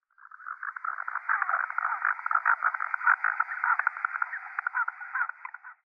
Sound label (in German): Ringschnabelente Aythya collaris